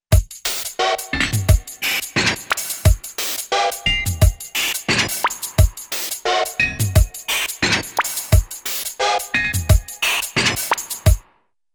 Rhythmic High-tech Drum Beat w-various Pops Whistles Etc; Cartoon; Hollywood Edge; Cartoon Trax